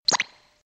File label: Beep